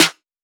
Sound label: G House Snare - D#; Drums; One Shots; Snares